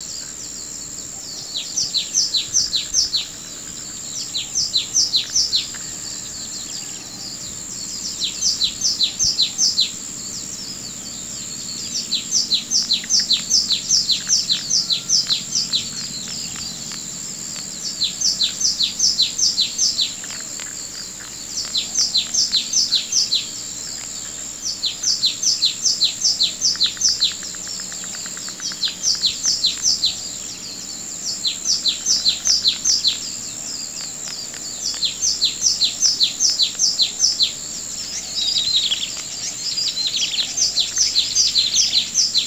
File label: morning ambience; E-MU Producer Series Vol. 3 – Hollywood Sound Effects; Ambient Sounds